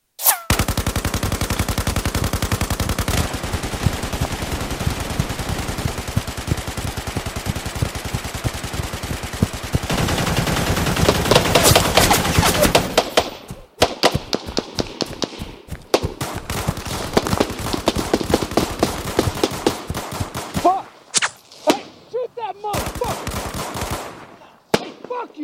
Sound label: Urban Warfare Ambience; tccc; Training Aids; FINAL EX SOUND FX